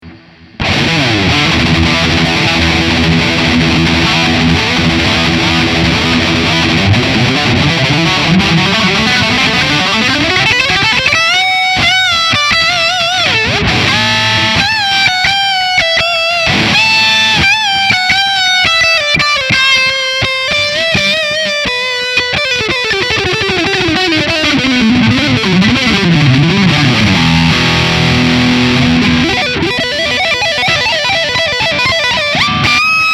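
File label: amplitube distortion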